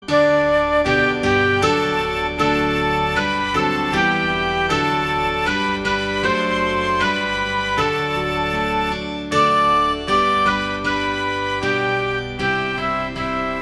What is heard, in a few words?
Denfield Tune